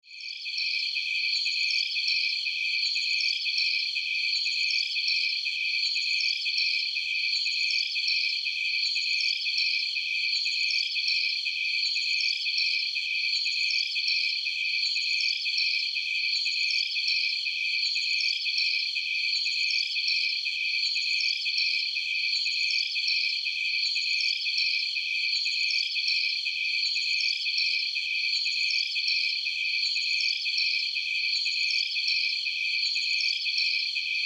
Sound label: Jungle Night, Ambience, Jungle, Night, Chirps, Insects, Bugs; 5.1; Surround Sound; BlastWave